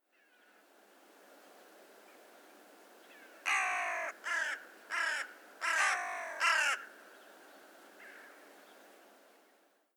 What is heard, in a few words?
Hooded Crow Corvus cornix; fekete istvan tanosveny